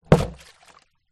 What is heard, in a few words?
foley plastic tub large shallow water inside down concrete; zapsplat